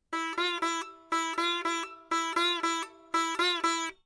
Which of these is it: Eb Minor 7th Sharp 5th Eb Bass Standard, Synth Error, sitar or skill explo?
sitar